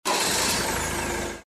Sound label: van sfx